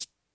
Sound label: Electro shake; Reference Beat